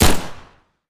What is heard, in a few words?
pistol fire; weapons; npc